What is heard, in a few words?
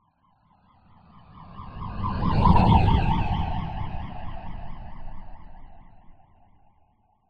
science fiction spacecraft pass by